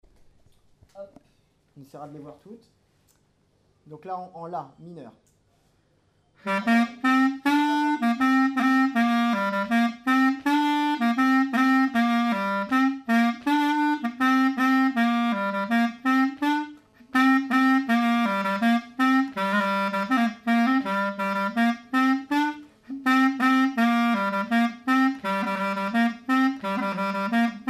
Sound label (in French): tour la plaine clar; stg clarinette